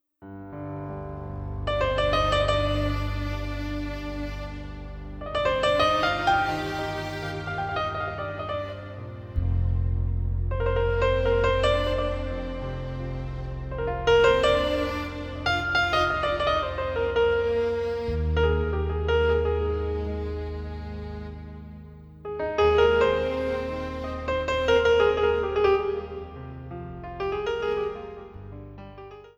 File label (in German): Romantik Piano Intro